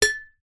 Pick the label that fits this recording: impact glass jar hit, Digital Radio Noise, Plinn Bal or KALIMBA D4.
impact glass jar hit